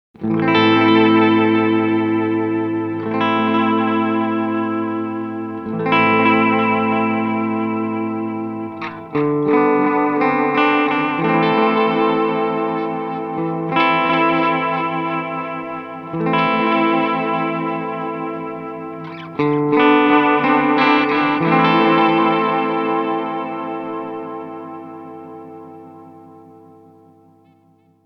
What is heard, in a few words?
Earthquaker Devices Disaster Transport time 12 Uhr mod speed fast mode bend